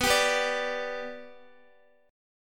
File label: B-Minor Sharp 5th, Guitar; strummed